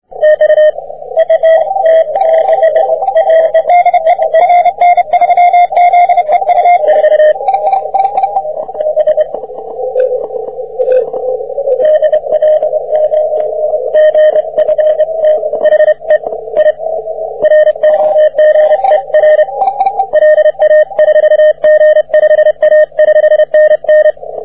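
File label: 20m Morse code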